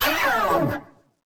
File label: stun; slender; roblox; coolkidd; princess